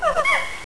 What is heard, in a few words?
death; quake2; schimp